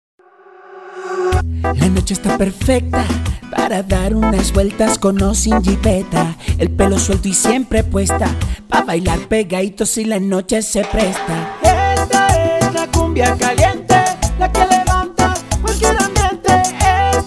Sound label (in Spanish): La cumbia caliente